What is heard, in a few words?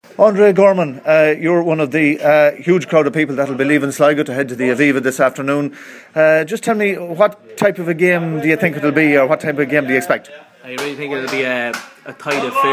Soccer Fans In Harp